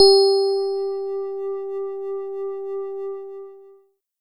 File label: fbam flute